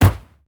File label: soft impact